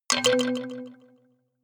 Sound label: notification kalimba delay octave up